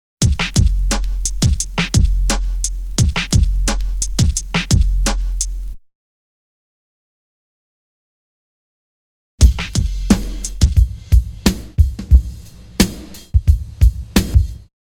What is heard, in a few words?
Drum loop; BEST OF DRUM BREAKS 30